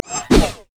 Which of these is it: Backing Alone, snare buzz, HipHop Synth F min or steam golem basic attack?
steam golem basic attack